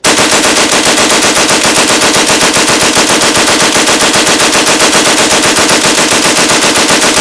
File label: vickers, cannon; wac